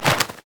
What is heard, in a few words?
ravens animated win; LoL; emitters map8; odin